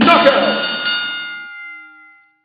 knockout; cuphead

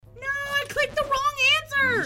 wrong answer

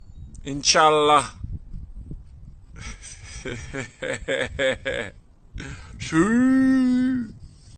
hehehe suii

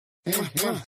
kiss kiss sounds

